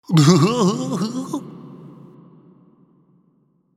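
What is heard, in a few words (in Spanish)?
risa, laugh; goofy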